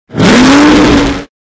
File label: accelerate backup; mcqueen; Cars; tractor tip